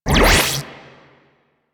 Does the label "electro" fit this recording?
No